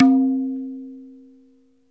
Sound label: tabla tun; ILIO; Synclavier Percussion 2; World & Orchestral